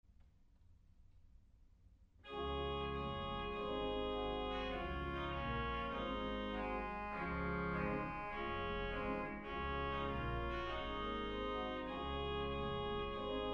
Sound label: organ; Purcell Trumpet Tune